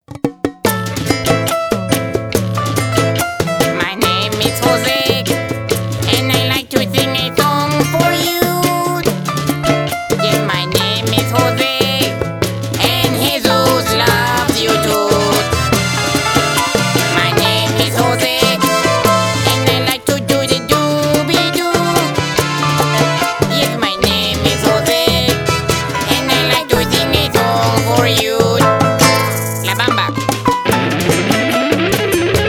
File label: Heavy Metal Song